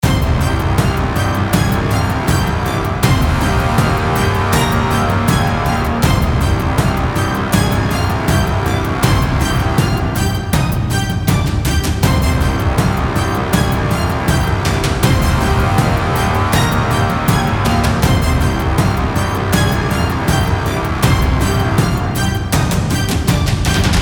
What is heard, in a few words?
dragon and tiger loop